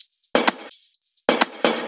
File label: Electro Loop 128 BPM; Vengeance Samples